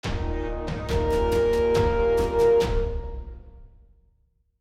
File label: stinger invasion; Kingdoms and Castles; Merchants and Ports